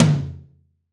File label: Real Tom, Roland BK-5